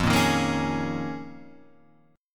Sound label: Eb 7th Suspended 2nd Suspended 4th